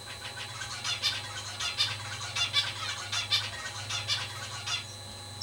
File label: Zebra Song, finch; Birds